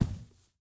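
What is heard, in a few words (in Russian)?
близкий редкий глухой звук выстрела салюта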